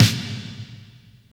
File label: SNR HALL, Snares; Roland - Rhythm Section; Snares 5